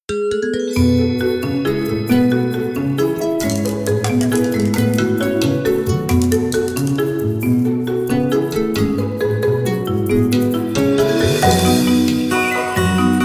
kalimba

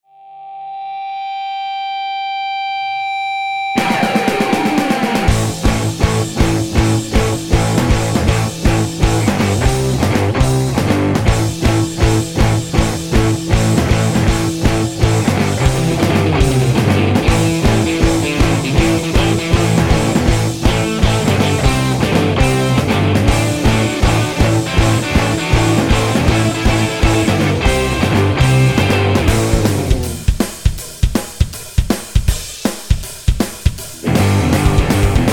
bicycle chain

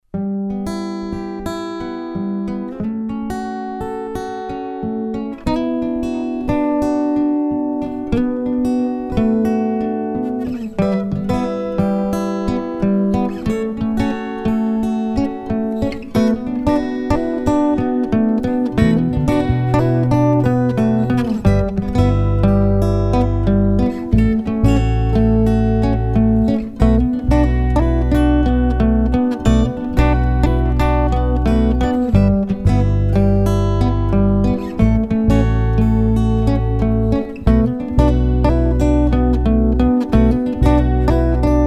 walking stick

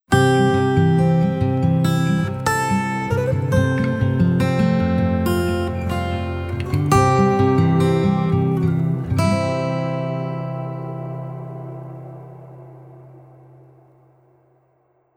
guitar jingle

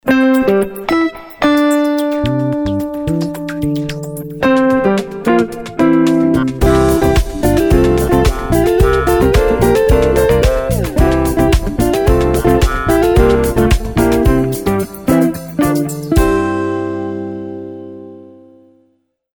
tv quizz jingle

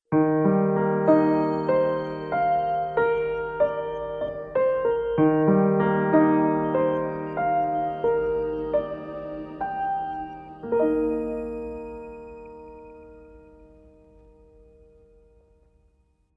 piano jingle

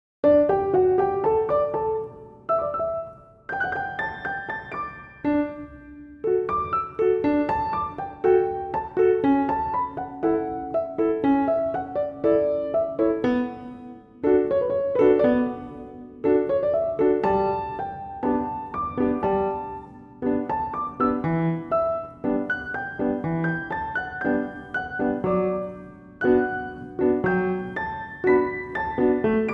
pianino

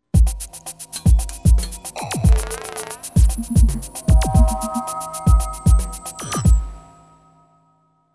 moderne percu jingle

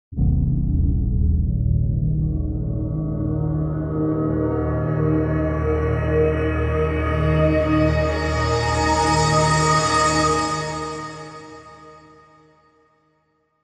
nap jingle

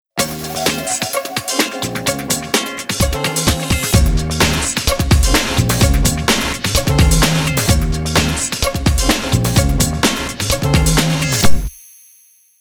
hard vinyl jingle

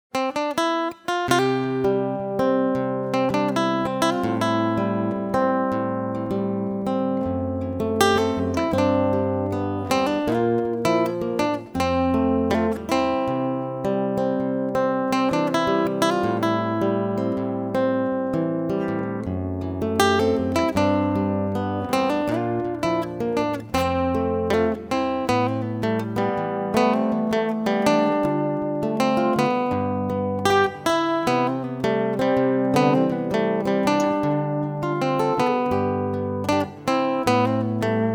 quiet strings

